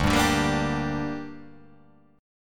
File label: D Suspended 4th Sharp 5th D down, chord